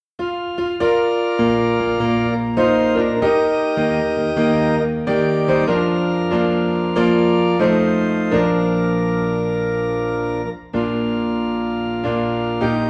Piano and Organ in B Flat; Christ Arose

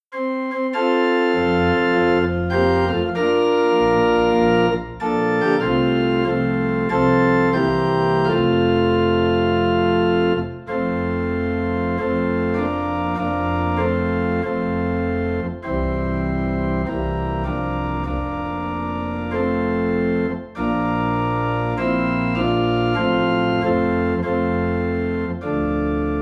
Organ Low in F; Christ Arose

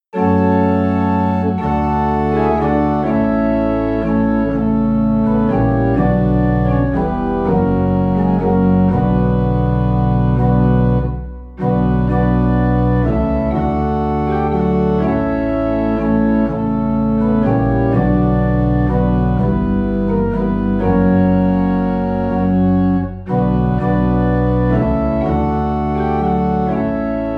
What Child Is This - Organ Low in B Minor; christmas

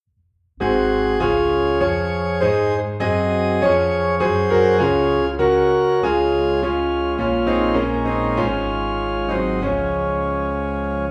Piano and Organ in D Flat; He Leadeth Me

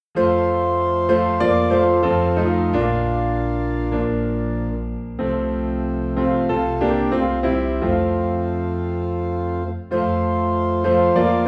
Piano and Organ - High in F; What a Friend We Have in Jesus

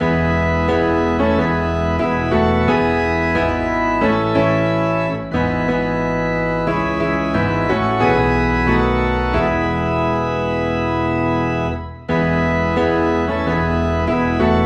Piano and Organ Low in E; Will Jesus Find Us Watching

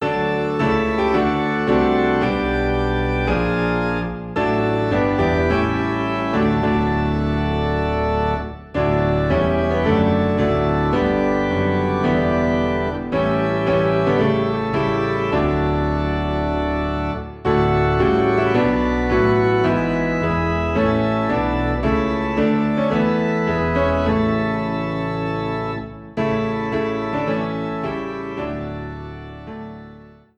Piano and Organ Low in D; By the Sea of Crystal

